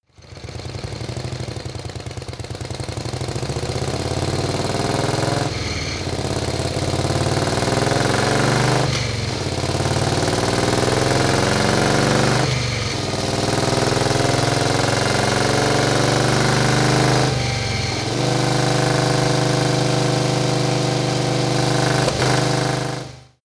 motorcycle harley engine accelerate gear sequence fast